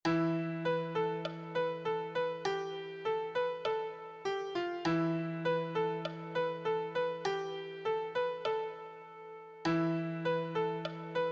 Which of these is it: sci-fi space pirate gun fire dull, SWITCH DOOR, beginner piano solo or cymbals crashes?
beginner piano solo